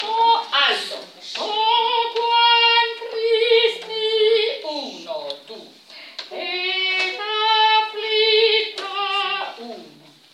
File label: o quam alti; Stabat Mater 3